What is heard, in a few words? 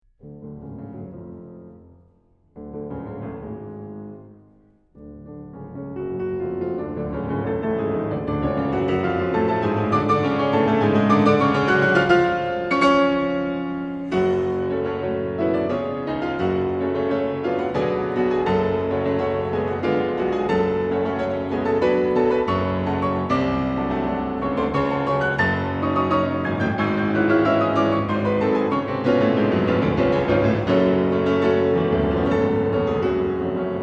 Polonaise No 5 F Sharp Minor